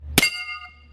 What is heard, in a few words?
Bullets hitting steel